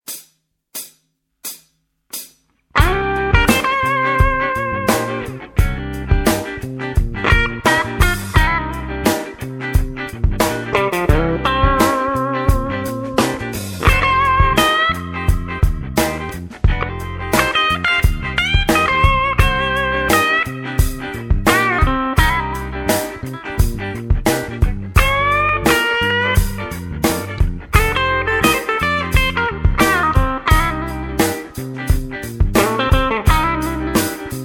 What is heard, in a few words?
blues jazz